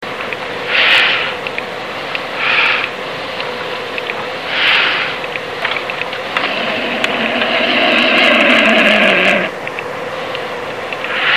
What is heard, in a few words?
black vulture, Belize birds birdsongs